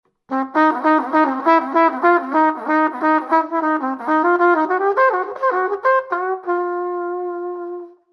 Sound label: Cool Blues Jingle